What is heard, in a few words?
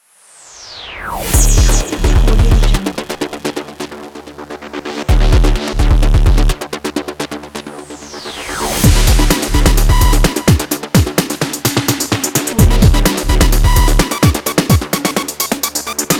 modern tech energetic logo for social media